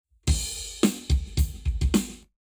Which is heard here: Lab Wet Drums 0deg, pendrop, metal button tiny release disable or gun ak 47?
Lab Wet Drums 0deg